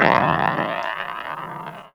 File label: Wood Floor, Marbles, contact mic; musicradar; contact mic madness; Raw